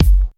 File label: crisp bass drum sound f sharp key, Royality free kick one shot tuned to the F# note; kick drum samples